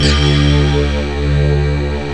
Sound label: choir G3; D50 STORY; East West; Global Sounds